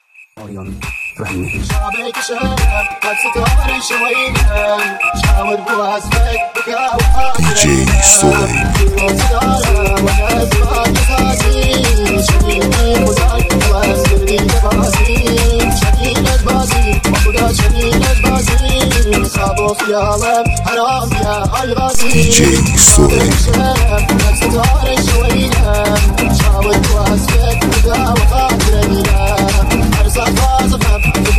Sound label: remix with a strong bass and a whistle